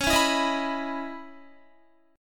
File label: C sharp Minor 9th C sharp Guitar; C#m9; strummed; 20,16,16,20,16,19